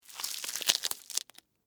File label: Sticky Rubber, Texture, Sticky, Short, Rubber, Gloves, Movement; Cleaning; BlastWave